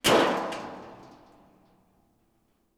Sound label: Metal Sheet; 99Sounds; The Warehouse